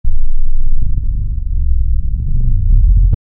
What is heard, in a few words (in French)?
un son trs grave qui, evolving